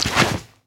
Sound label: zomb body impact; kfsounds; css